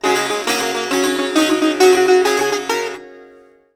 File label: SITAR GRV, World Colours; Best Service